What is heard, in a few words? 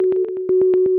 122 BPM Arps A maj F min Tonic F; arp essentials